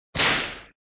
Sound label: Sound Whip smack, flipper